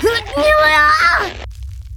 death; quake2; buttercup

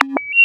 tracer; quake2; zumlin

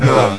jump; quake2; phantom

